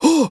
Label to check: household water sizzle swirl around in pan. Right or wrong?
Wrong